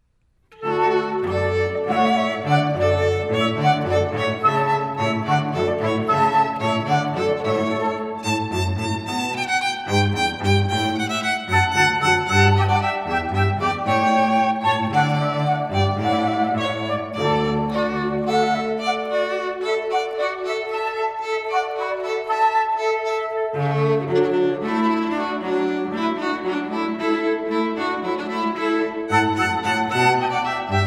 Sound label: Hornpipe, Flute; Water Music